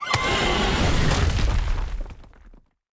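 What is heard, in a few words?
evil Earth Servant attack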